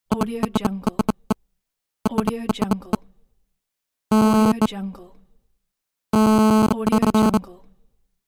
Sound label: gsm statics